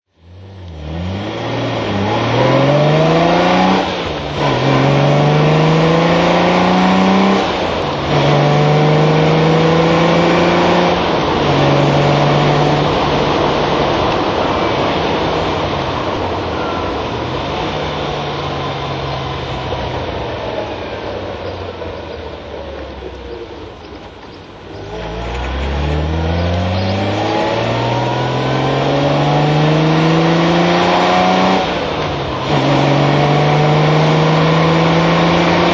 jaguar e type 42 ots drive

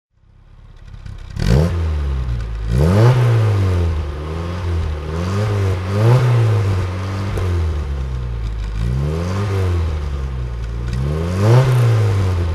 mg midget 1275 throttle

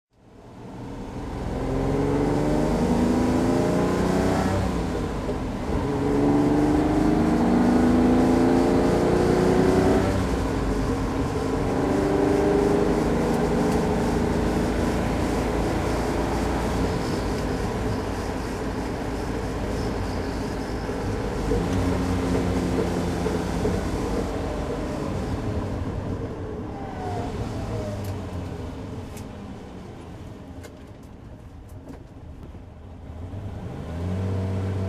ferrari 330 gt 2+2 drive

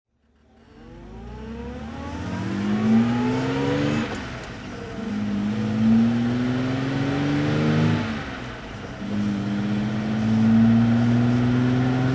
healey 3000 mk3 ph1 driving

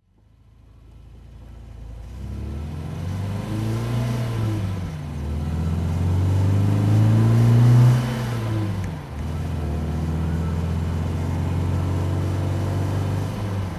MG MGB driving, roadster; MG MGB roadster, 1972; Engine sound driving; V3D/ ClassicarImages

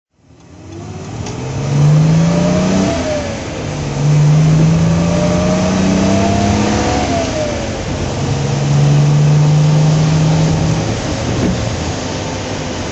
mg mgc gt driving

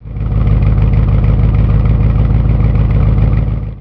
stag idle

